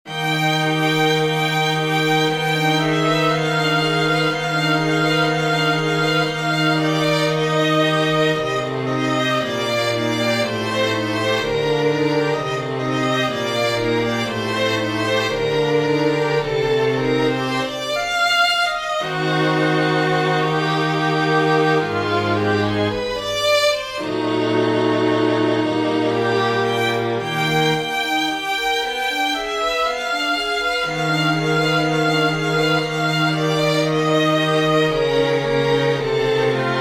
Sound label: string quartet; Arietta; Lyric Pieces